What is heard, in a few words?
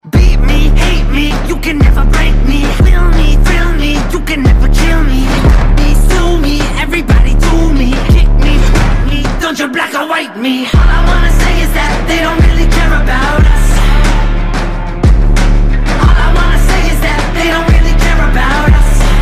ringtone